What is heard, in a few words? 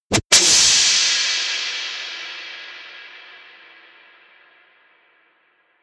whipping; bewegung; comedy-cartoon; soundpool